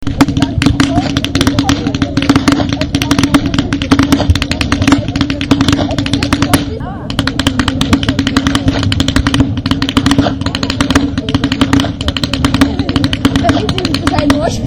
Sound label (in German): schnalzen